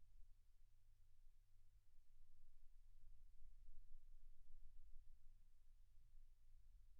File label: silence 48kHz